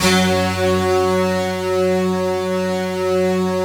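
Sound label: fast attack fuzz, Fuzzy Stacks; Optical Media International; Sonic Images Library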